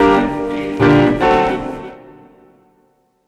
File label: Melody Loop, Cinematic Funk Urban Tone Poems; Sonic Foundry (Sony Creative Software); Session 05 - The Triad